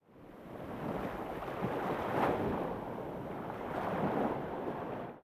ambient port default; World Of Warships

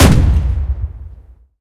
bk37 singleshot, guns

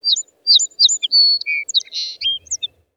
Amb Animals; Red Orchestra Ostfront 41-45

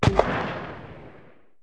grenade launcher far

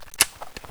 Inf Weapons Foley 3rd; Red Orchestra 41-45

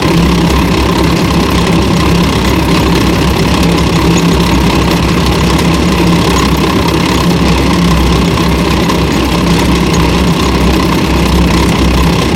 tracks light speed 99, tanks; War Thunder

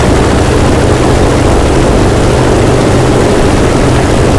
engine bomber rpm close; War Thunder; engines

